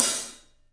Paiste hat, drums; 3D Sound

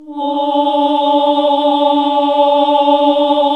OHH D, Nuns Ooohh; Sound & Vision - Gigapack II

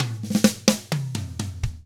surf rock, Drums; ILIO; Double Platinum Drums 1

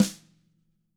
TAMA SNRD; ILIO; Double Platinum Drums 1

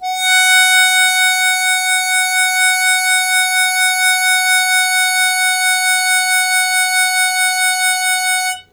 Long Sustain Fast Wah Wah F#2, Harmonica; Zero G - Harmonica

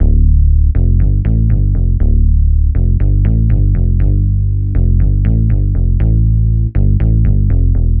Synth Bass; Bass X

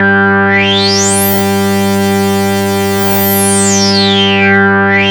FILT SWEEP G4; MINIMOOG; Greytsounds VOL-1; MINIMOOG 2

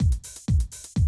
125, Classic Drum Loops

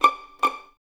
Violins Pizz, String Ensembles; Miroslav Vitous; 11 Violins

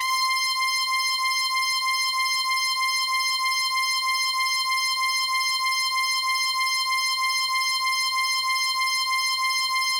Brass, Yamaha DTXpress

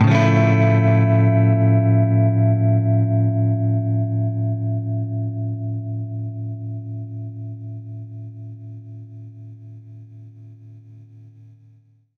Baritone Chord Dmin9[maj7], Guitar Chords

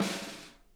snare, drum kits

